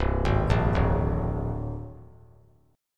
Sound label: C 7th Suspended 2nd Sharp 5th C down; C7sus2#5 arpeggiated; JGuitar